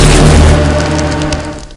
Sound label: portal open